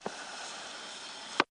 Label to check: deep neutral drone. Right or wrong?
Wrong